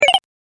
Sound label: Platform Game Beeping Sound; Video Game Sound Effects; electronic sound effect; beeps, bleeps, blips